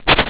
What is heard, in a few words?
fight; Someone'sRPG